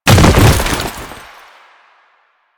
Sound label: Bodyfall Default; Warframe; Lasrian; Lasrian Soldiers; Foley; Body Falls